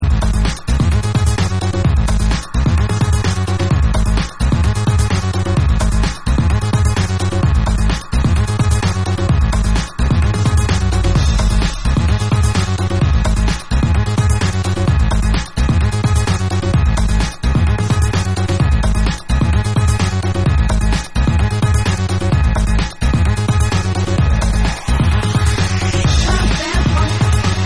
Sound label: FUNK